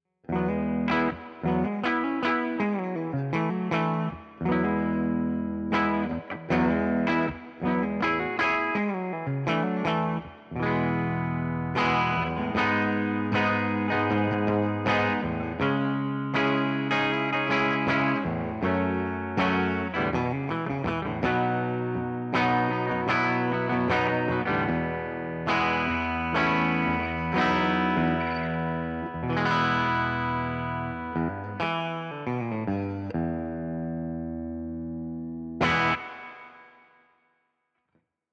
Bridge Clean, electric guitars; Gusta GST Standard; gst models

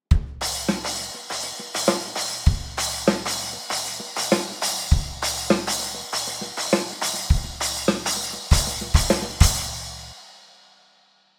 Splash, cymbals; drums percussion; Gusta Star Light Cymbals

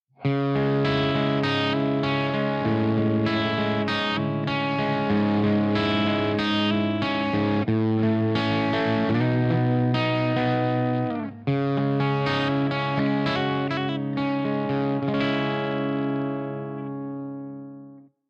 Clean Bridge, electric guitars; Gusta EX All Black MOO Big Ass Signature

